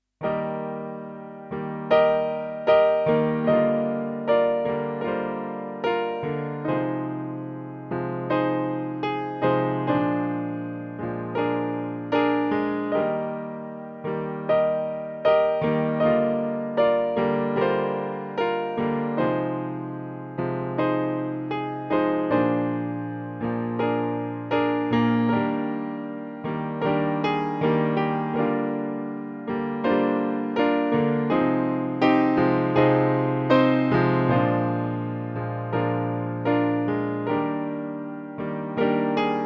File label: e flat chords; Improvisation